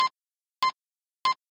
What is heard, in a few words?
c4 click; weapons; suicidebomb; M9K Specialties